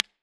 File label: AC30 normal sm57 neve closer; IRs